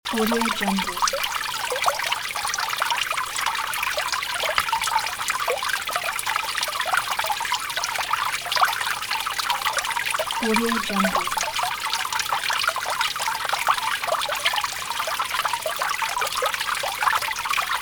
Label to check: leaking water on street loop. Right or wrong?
Right